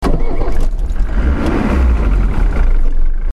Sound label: start Mercedes-Benz MB 100